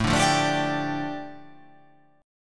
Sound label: A 7th Suspended 4th Sharp 5th, A, down